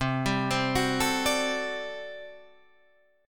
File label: G Major 7th Suspended 2nd Suspended 4th C